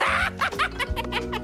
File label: hahaha; timon